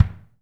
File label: BD 1L R, DRUMKIT